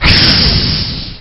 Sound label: fire hiss